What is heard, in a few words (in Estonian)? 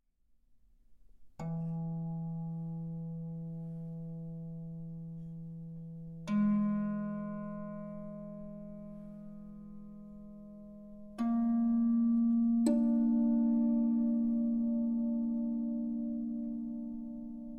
summutatud, Kannel; Seda effekti saab kasutada ainult suhteliselt pikkadel nootidel või aeglases tempos.; Resonabilis